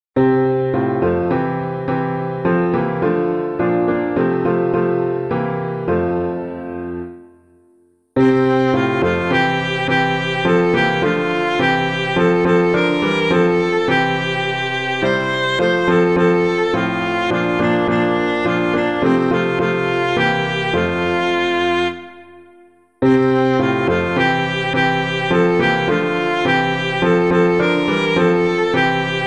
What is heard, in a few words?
The Spirit Sends Us Forth to Serve, AZMON, piano, hymn